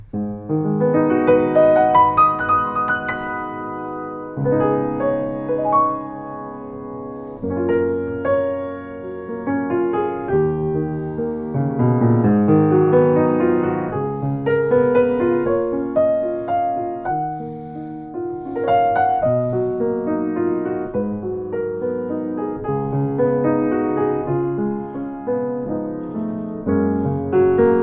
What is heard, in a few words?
C. Bechstein M, piano